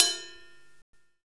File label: Ride set, Drum and Bass